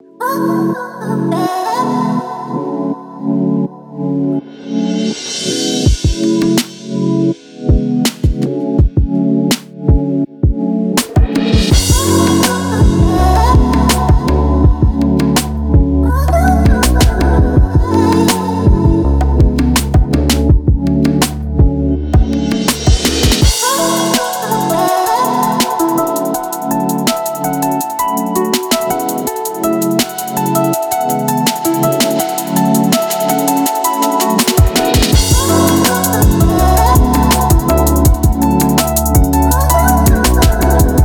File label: Soulful RB Jam